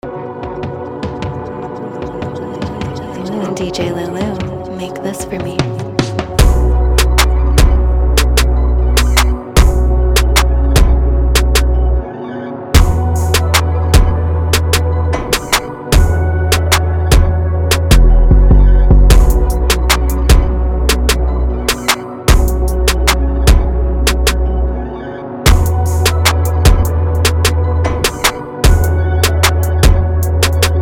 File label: type beat